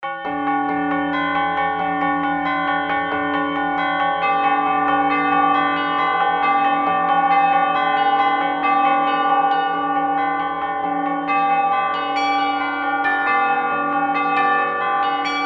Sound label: bells 13edo; bell tuning compare